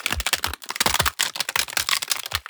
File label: Loop Ice; KriptoFX; Realistic Effects Pack v1; PARTICLES